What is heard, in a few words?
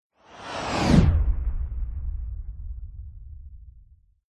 science fiction spacecraft powerful pass by super sonic fast whoosh; zapsplat